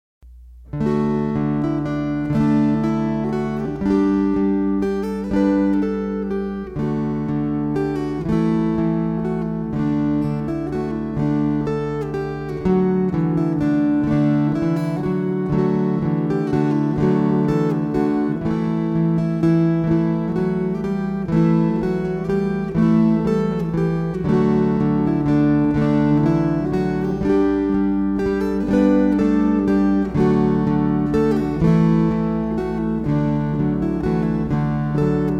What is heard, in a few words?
Waltzes